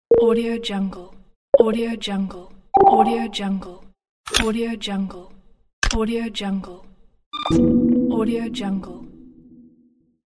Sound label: button menu application sfx